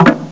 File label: Make Selection; Weekend Warrior